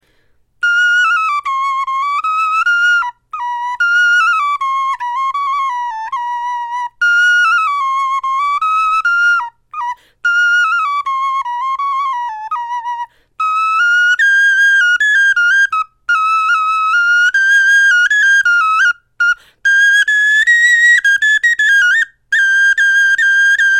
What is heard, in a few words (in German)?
melodie, knochenfloeten